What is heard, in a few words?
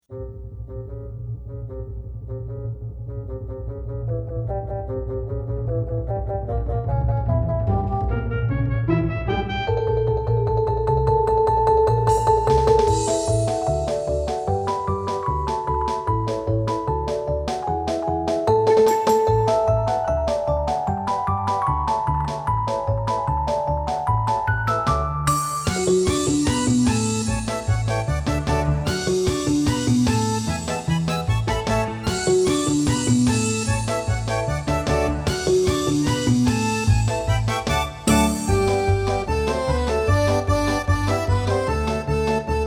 Music Box Orchestra; Gaite Parisienne, Vivo, Jacques Offenbach; The Baby Einstein Music Box Orchestra; Art Time Classics; Baby Van Gogh